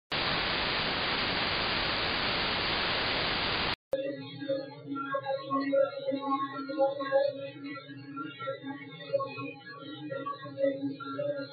Synthetic with and without filter sample original and filtered